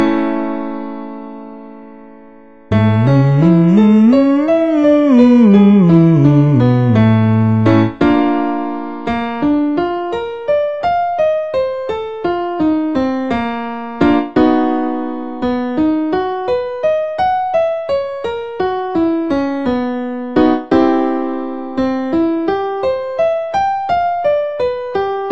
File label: hum; Упражнение 8; Это вокальная техника, используемая для тренировки голоса и улучшения артикуляции, дыхания